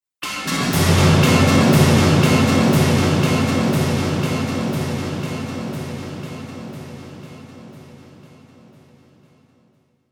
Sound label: Drums Cinematic Stinger; Sonic Logo Sound Effects